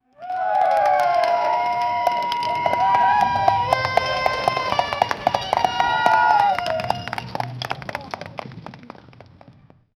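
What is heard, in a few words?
drums and trumpets playing, horse hooves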